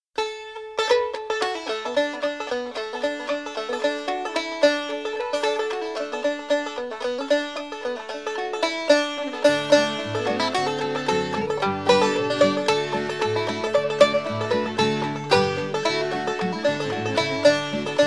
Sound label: Inst; Walking in Jerusalem